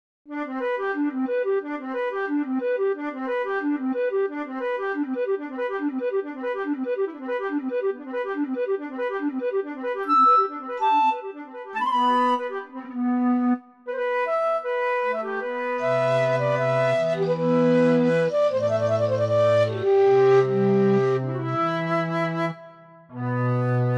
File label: sinfonietta for flute sextet